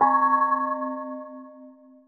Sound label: gong, Roland MC-909